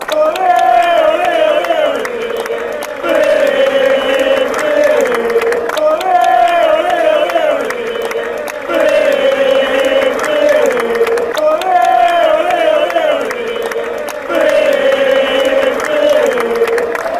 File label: ole ole ole, chant